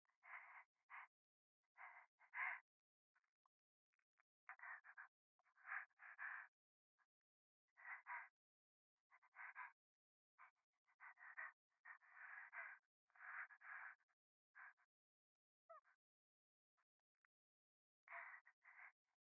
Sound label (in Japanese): 左耳快感吐息